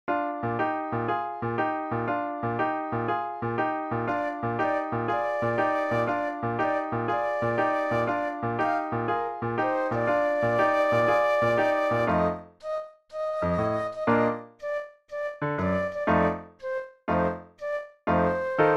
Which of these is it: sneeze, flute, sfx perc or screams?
flute